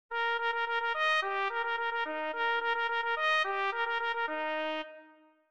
AVANÇAR clarim

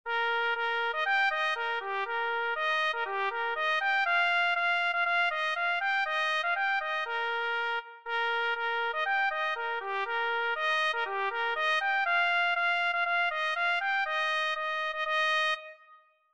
A VITORIA clarim

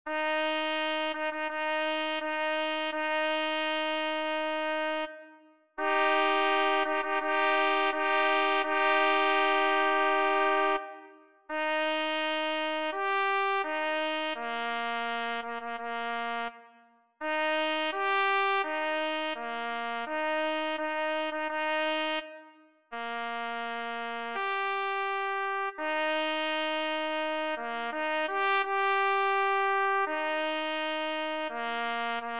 MARCHA FUNEBRE clarim

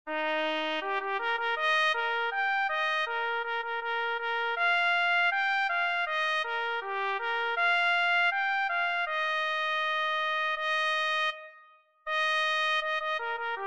PARADA clarim

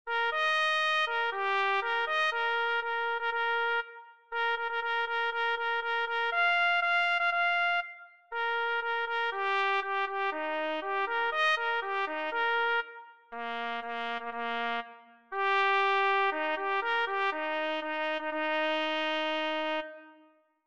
MARCHA BATIDA clarim

